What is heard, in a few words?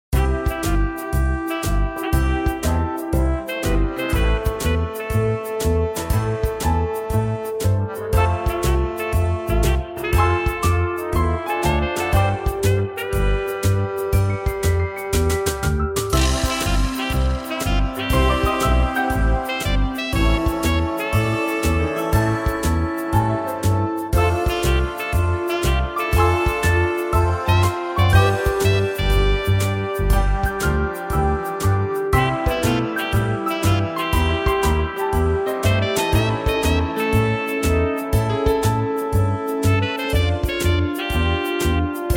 tenosax